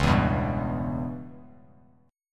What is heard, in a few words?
C sharp Minor 7th Flat 5th C sharp Bass Standard; C#m7b5 strummed; 9,7,9,0; JGuitar